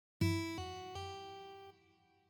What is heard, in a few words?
Hammer Ons